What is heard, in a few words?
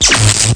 electrix; amiga